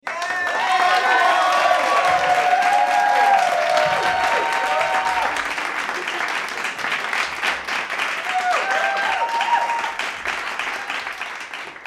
Crowd Applause and Cheer

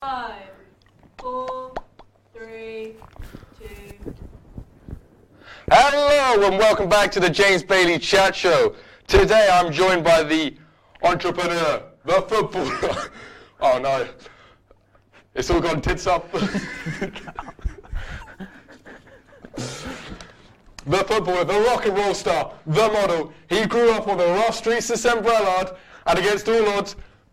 Studio Recordings

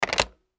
Telephone

